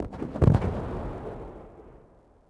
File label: distant explo